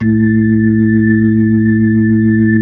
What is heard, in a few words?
B-3 Chorus